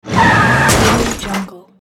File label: car crash building